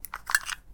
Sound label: batt from clicker; electric